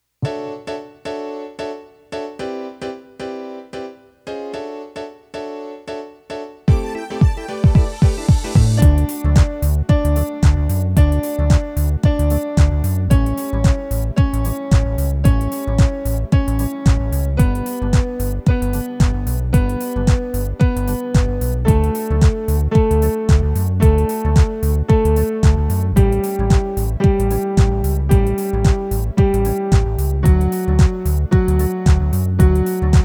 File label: Descending